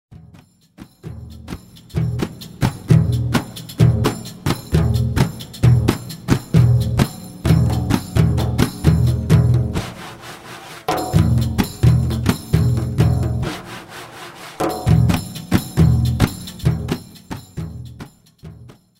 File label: Frame Drum Opera; Ratatouille